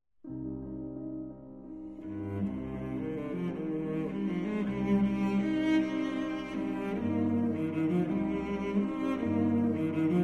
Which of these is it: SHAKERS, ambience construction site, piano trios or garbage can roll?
piano trios